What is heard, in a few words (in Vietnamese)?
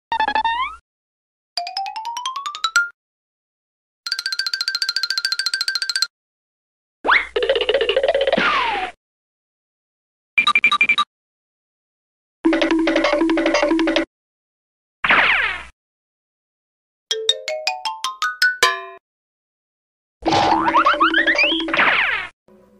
tieng buoc chan vui nhon